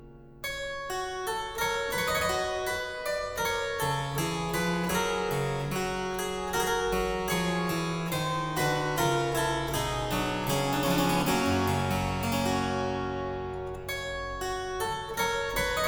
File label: Aria Variations in G Major, Harpsichord; La Capricciosa; BuxWV 250; Buxtehude; Opera Omnia; Harpsichord Works